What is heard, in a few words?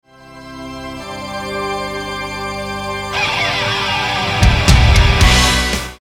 Dirty slide